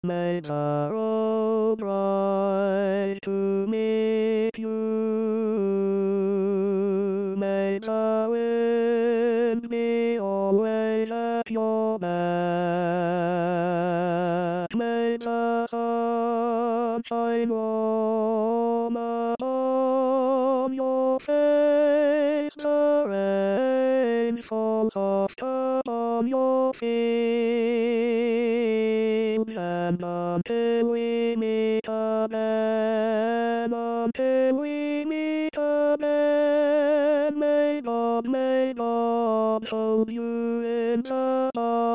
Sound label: tenor; An irish blessing